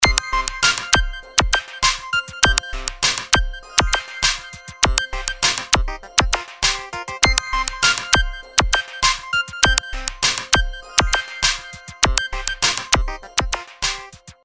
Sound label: Clang, ringtones; Coolpad T2-W01